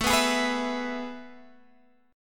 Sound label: A Major 7th Suspended 2nd, Guitar, Open G